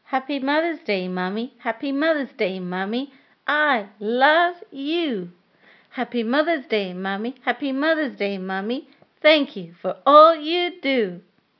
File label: chant; mothersday; unit1